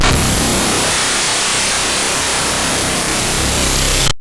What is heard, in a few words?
os2 shutdown